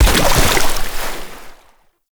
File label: Mech Step Surface Water; Vehicles; Step Surfaces; Warframe; NechroMech